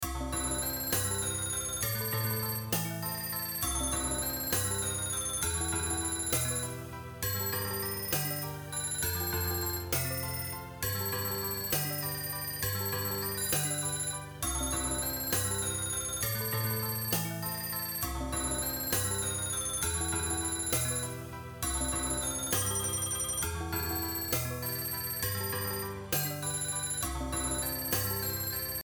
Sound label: telephone nightmare tempo 100